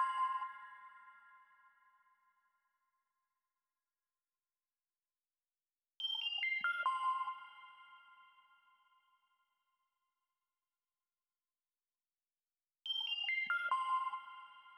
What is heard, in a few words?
puzzle action loop layer melo; freeflight